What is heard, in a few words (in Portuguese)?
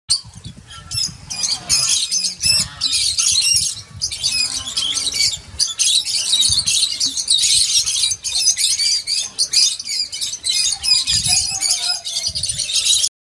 canto do papagaio mulga